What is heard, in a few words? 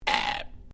Created Creature Small Cry, alien, created creatures, vocalisations and pretend speech; Aliens; SoundEffects Foundation2